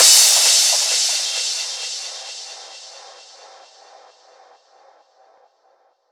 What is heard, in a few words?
Crash, Cymbals